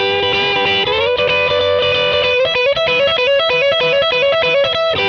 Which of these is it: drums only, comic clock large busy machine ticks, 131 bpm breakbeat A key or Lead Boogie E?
Lead Boogie E